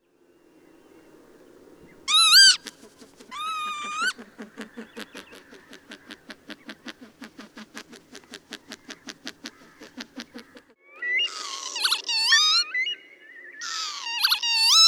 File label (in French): Vanneau huppé